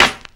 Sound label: shiny snare drum b key